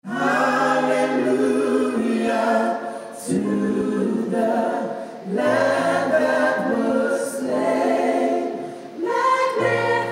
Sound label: Gospel